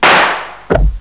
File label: shot thud